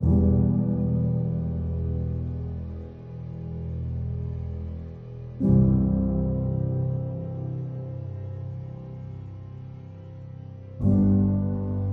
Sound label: Emotional Ambient